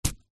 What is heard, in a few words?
horror kitchen knife stab body; zapsplat